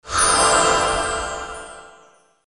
teleport

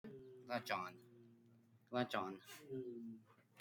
drum

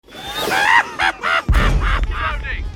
laugh; randall

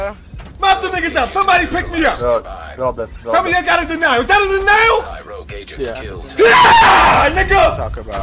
rage; ripper

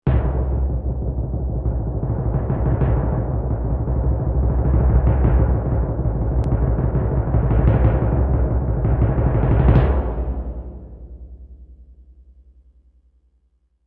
drum roll bass

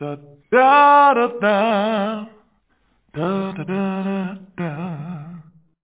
male singing

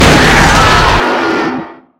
screamer

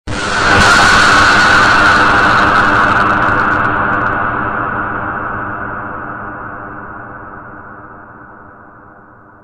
tripmine explosion; subspace; roblox

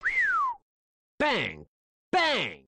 bang bang

